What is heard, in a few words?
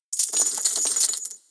coins; games; Corrida Romance; Wazdan